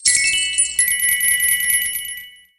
prize effect

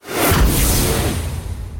Card Open High

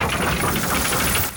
Card Open Gold

